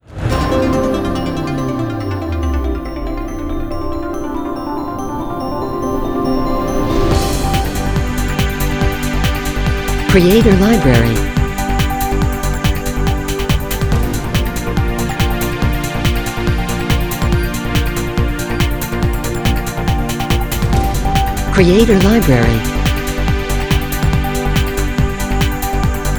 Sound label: World News Open, corporate music